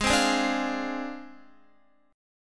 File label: Ab 6th Flat 5th Ab, Guitar; Ab6b5 strummed; JGuitar